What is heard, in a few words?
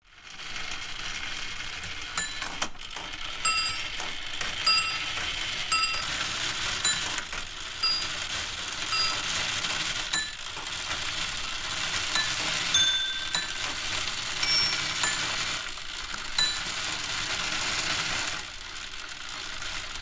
Clock 17th Century Chimes; Churches Bells & Clocks; Hollywood Edge Historical Series